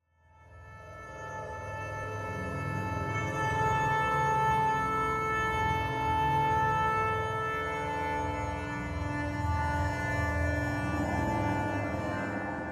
Metal Rubs Stinger; David Grabs Ellie

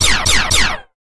Risen scrap shot

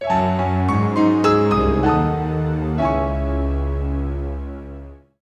Divorce Jingle

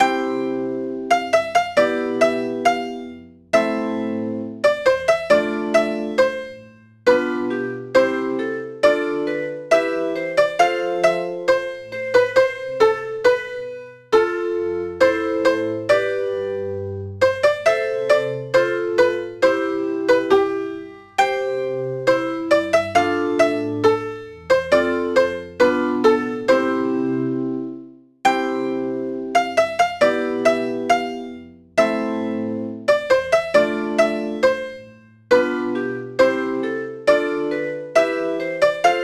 Music Sad; Tales to Enjoy Little Red Riding Hood

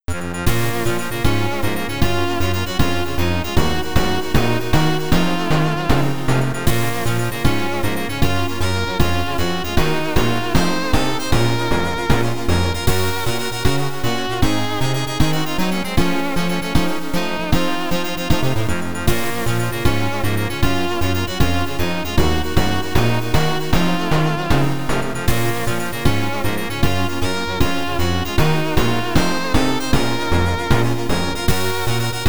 BGM (YM2608); New City Hero

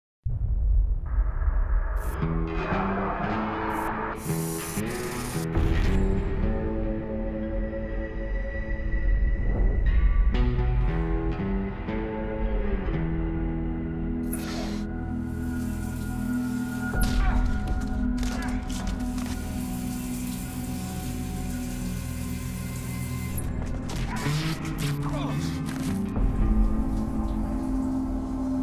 Menu Ambience; Hitman Absolution

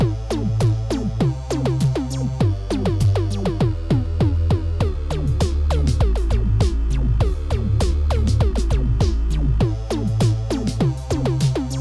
Weird Beats; psychopomp

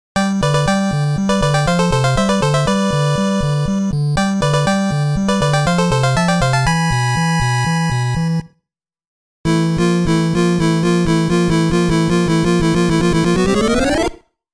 Congratulations Fanfare; Game Sound Museum Namcot; Druaga no Tou; Ki no Bouken

